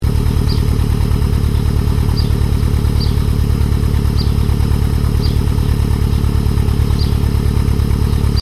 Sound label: after idle, cat back; sti